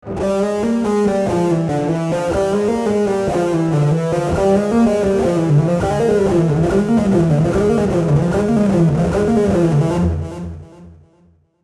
legato

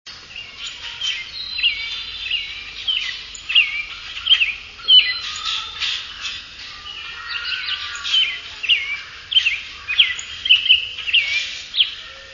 robin; birdsongs

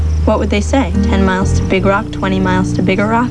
Rock

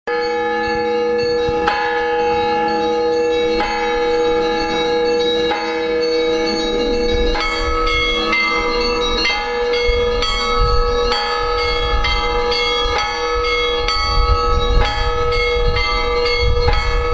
bells

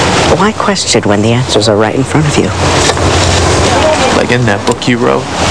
book

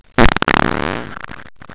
fart

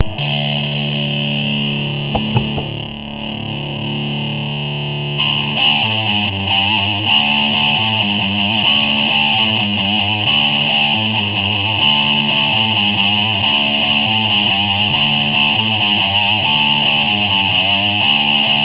Guitar Playing

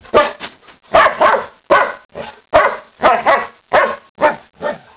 dog bark

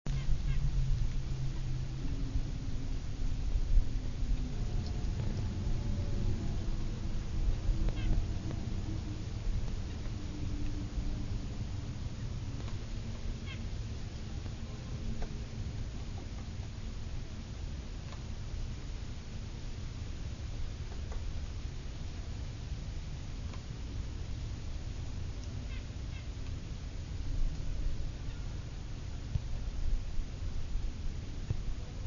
nuthatch, white breasted; birdsongs

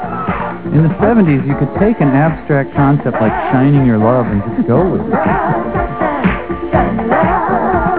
disco

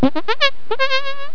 charge

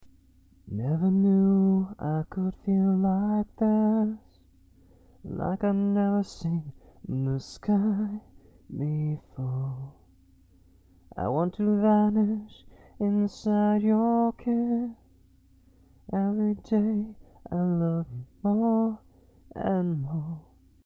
Yoshi singing

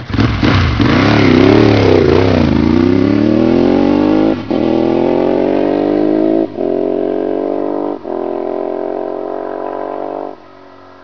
duc 900sp

